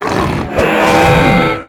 Crow Idle; FlyForInsanity

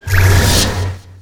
SKILL STAFF COSMIC ELEMENT; Fly For Insanity

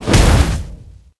bomb explode; Candy Crush Friends

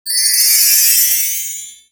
Skill barrier; Fly For Insanity

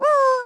spirit death; FlyForInsanity

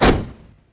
spirit attack; FlyForInsanity

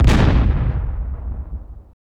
Triple Shot; Pc Skill; FlyForInsanity

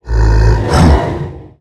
Grrr Atk; FlyForInsanity

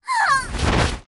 Harpy die; FlyForInsanity

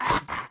death; FlyForInsanity

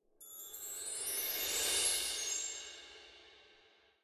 intro camera scrolling; Candy Crush Friends

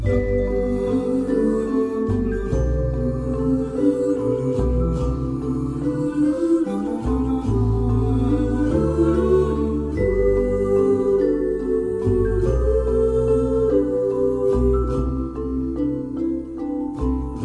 music map loop; Candy Crush Friends

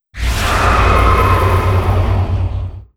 Demonolgy; Fly For Insanity

